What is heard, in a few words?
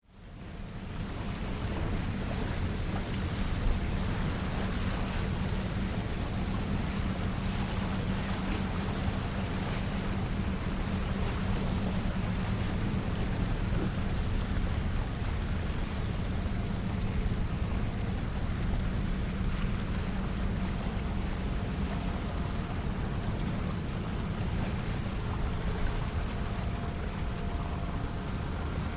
Ferry Boat Steady